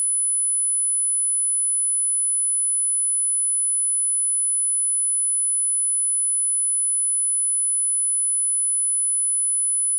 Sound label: tone 10khz